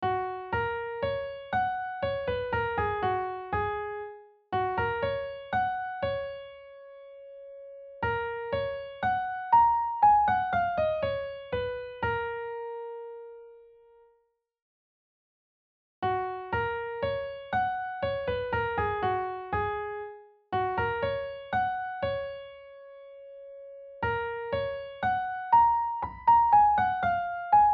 melody f sharp major

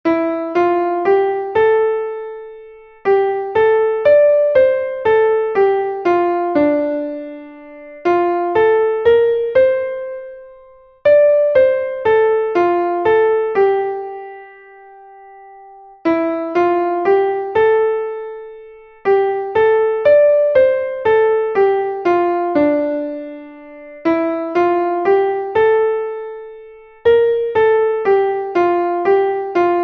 melody; londonderry air

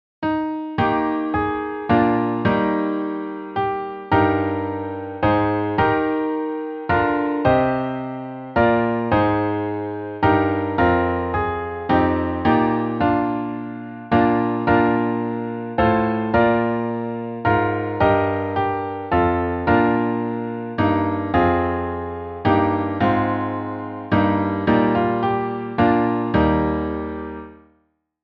When I Survey simple chords

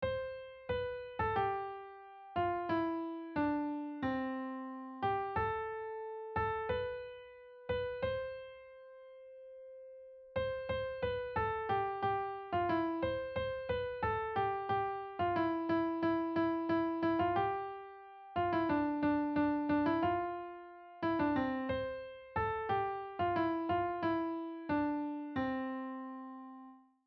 joy to the world melody, piano

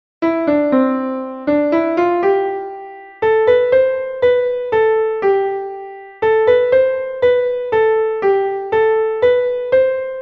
the first noel melody

